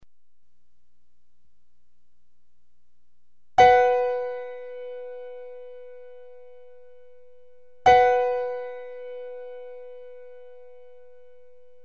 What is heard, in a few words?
piano